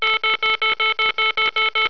beep beep beep

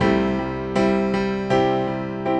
Bluesy C 70s Ballad Piano One Bar

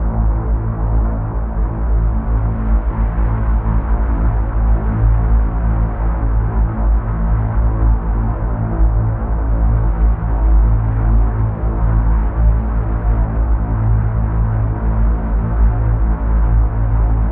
Am Bass Grains, Granular Synth Loops; Brood; Hydrosphere

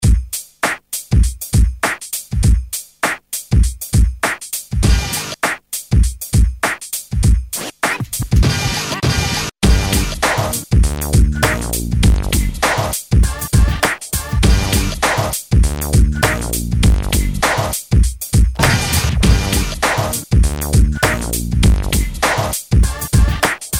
background pads

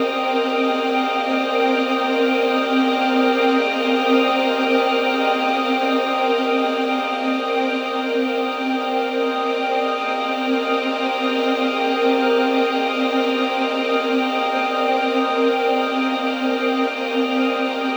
Cm7 Ambiance, Ambient String Loops; Stratify; Diffraction

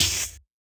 OHat, Drums; Interesting Drums

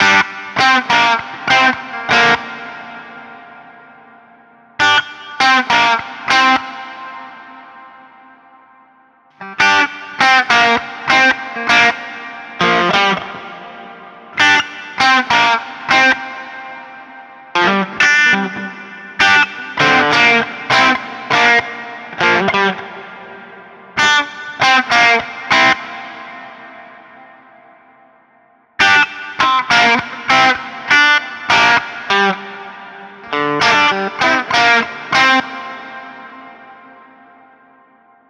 Blues Guitar Stabs G 100

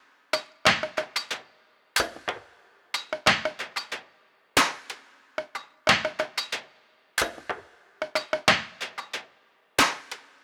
92 percussion; Ambient; Ambient Piano Loops; C and Dm Night Mood

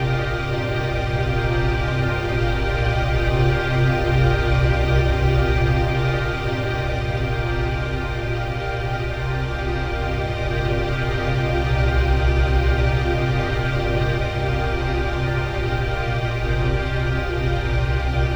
100 C7sus4 Ambiance, Ambient String Loops; Stratify; Diffraction

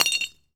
BANG, explosions gunshots

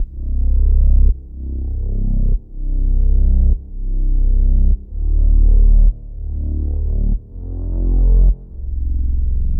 100 C bass, ambient piano loops; Low Tide; C and Dm Night Mood

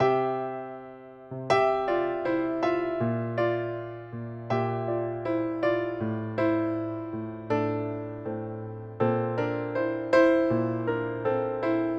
Delicate Piano

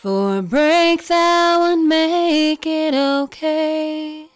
clipped Vocal; Clipping Examples

